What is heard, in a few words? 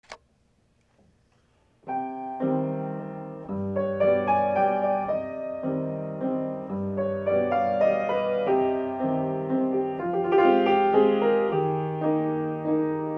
Piano